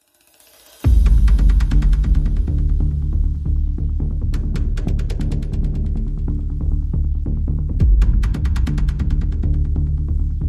Perc and Pulses Only

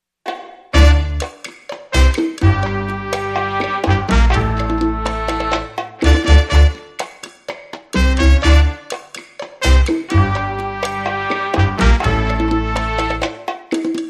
No Trumpet Solo

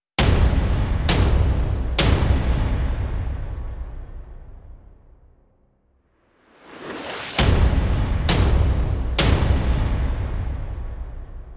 No Fear, No Choir No Strings

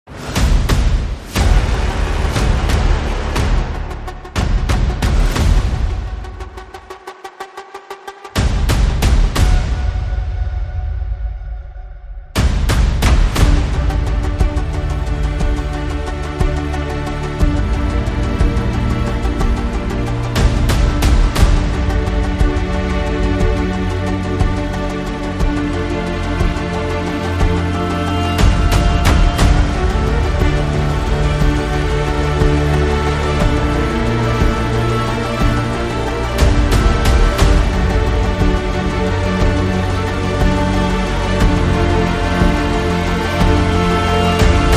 No Vocals

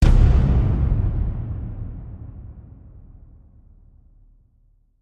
Hit Lo Punch Me

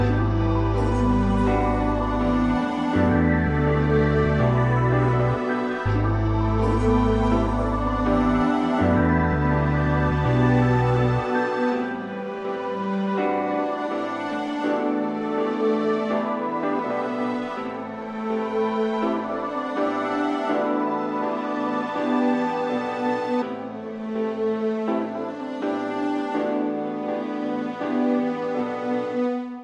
Electric Touch, No Drums

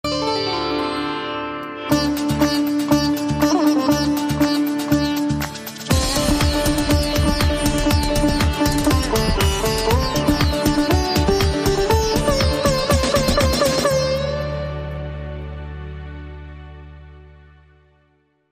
Fusion

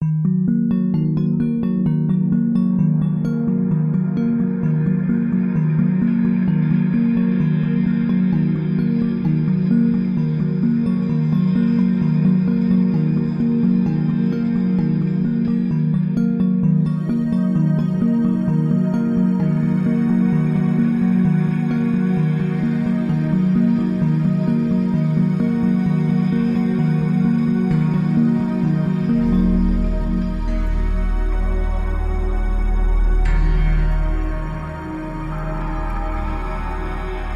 Drone, Light

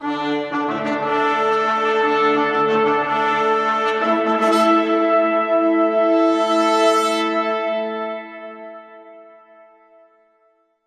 Fanfare

